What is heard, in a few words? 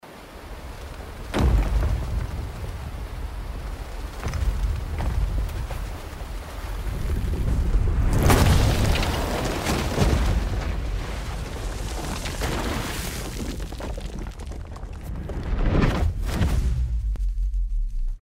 black destroy; gris